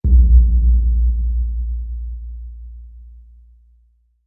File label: Bass Impact Low Tona; impacts